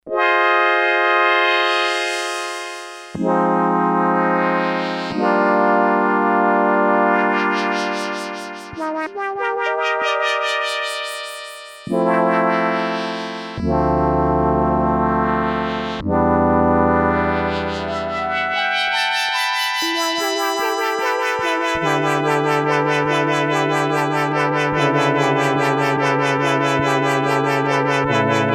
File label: Release Filter, Sequential Circuits Six-Trak; Factory Programs